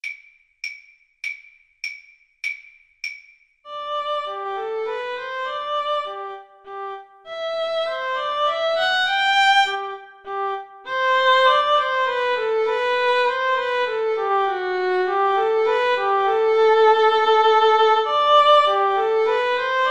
minuet, Violin Duet; bach

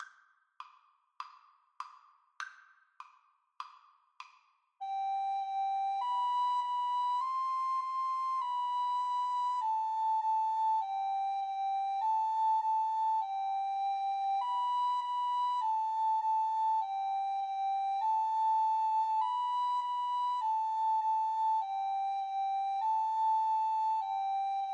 twinkle, Recorder Duet

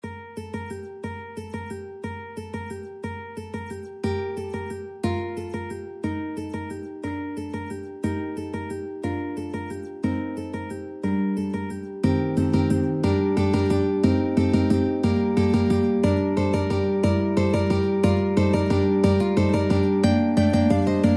carol of the bells, guitar ensemble

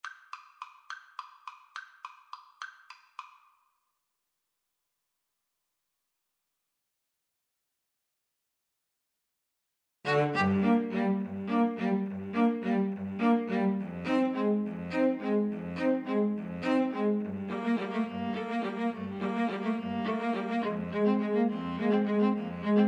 danse macabre, string trio

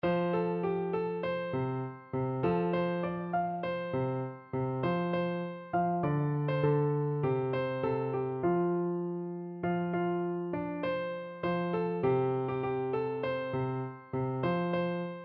piano

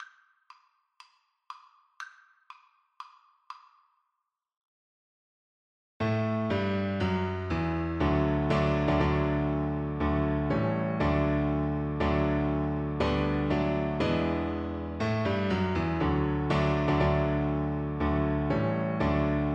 Piano Duet

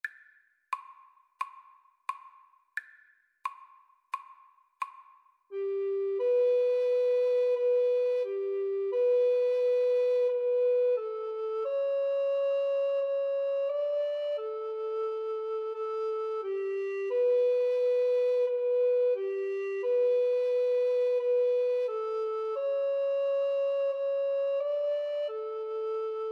aura lea, Alto Recorder Duet

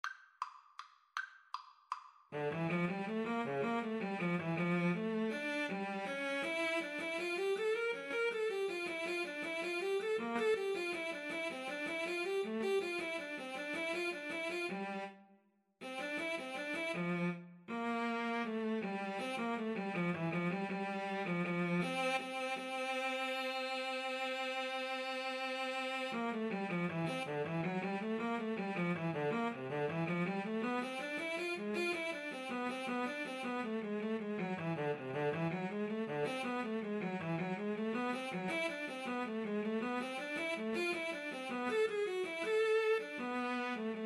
Cello Duet

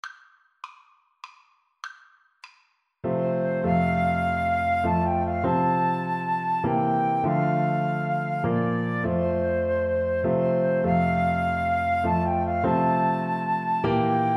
Flute duet

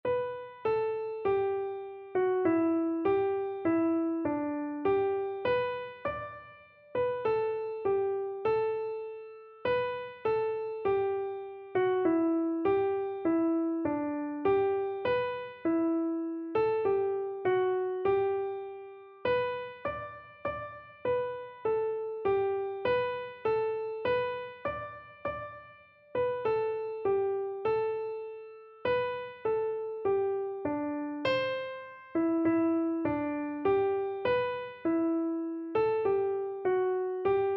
tis so sweet, keyboard

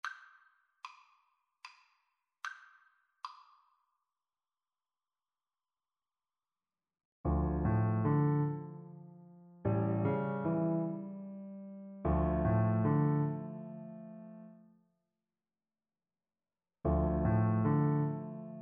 Double Bass

